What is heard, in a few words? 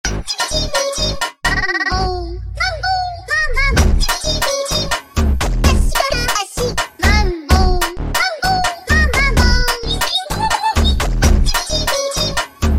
Funk sped Up